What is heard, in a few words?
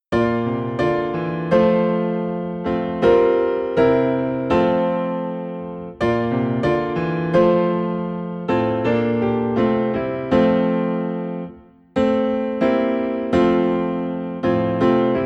Piano in F